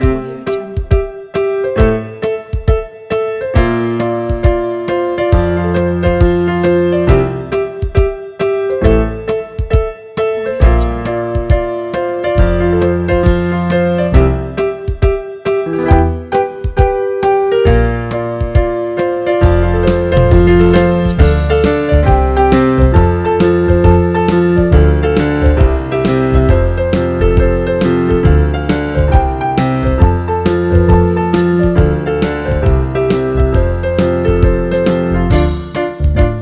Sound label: happy trio; awkward-ninja